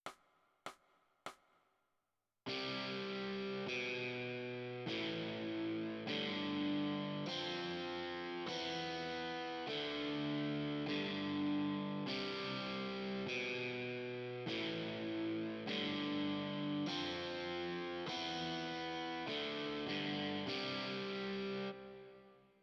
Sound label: OverDrive Guitar